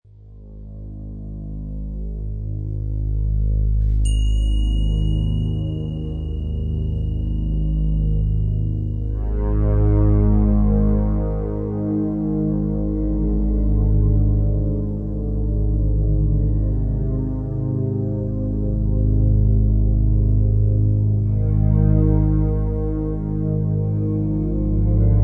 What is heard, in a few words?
Yaman Ambient Mix